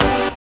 SYN TONE; Sound & Vision - Gigapack II

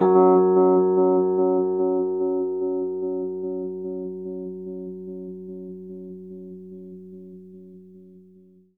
Rhodes octave F#, L; 3D Sound; 3D Keys VOL-1

